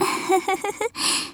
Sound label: laugh; Eternal Return; Tia; JPN